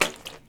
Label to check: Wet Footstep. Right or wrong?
Right